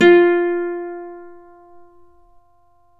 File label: Celtic Harp, Plucked Strings